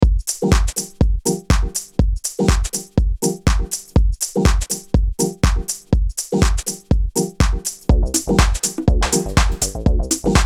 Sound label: deep house 4 bar